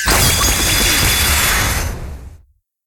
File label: Bigwin Megawin Supermegawin Txt Change; koi princess